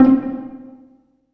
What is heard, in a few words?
PIZZ, Dance Mega Synth; Best Service; 90 s Sample CDs